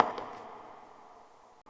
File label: step; succubus